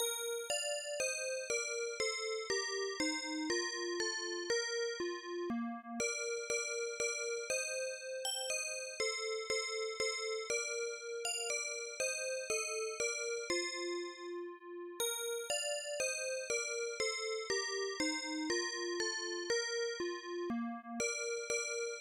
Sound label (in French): MENUET